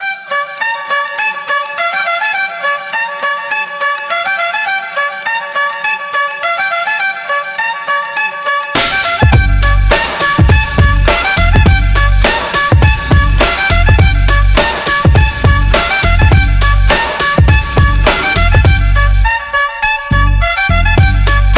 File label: Seagull Break 103bpm